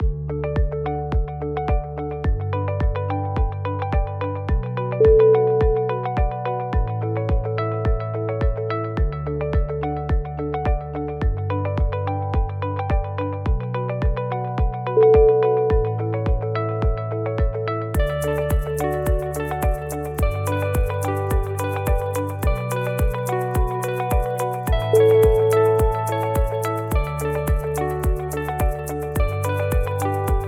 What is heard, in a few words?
soft promotional